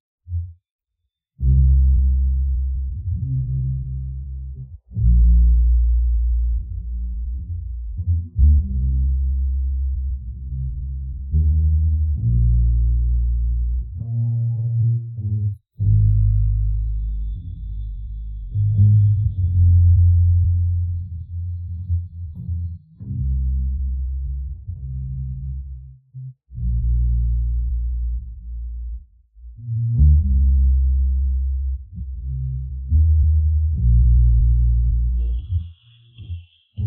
Bass; Aunt Wang Syrup

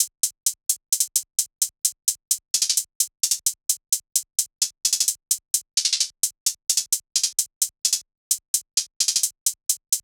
beat loop hihat 130; cheddar

